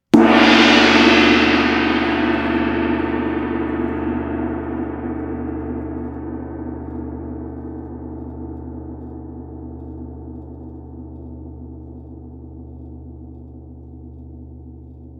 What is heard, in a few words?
Old Zildjian Gong Quite Natural